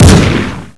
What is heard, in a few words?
assault, weapons; ufoai